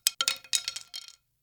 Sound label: wooden stick drop